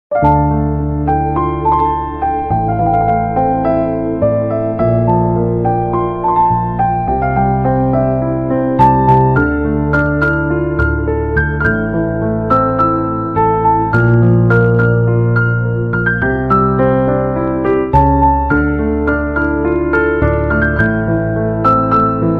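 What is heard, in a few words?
tamil background music ringtone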